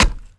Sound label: Chop Wood; Civ4 warlords